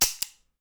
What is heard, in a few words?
Trigger Near Mono